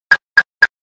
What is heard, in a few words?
Airbus AP Click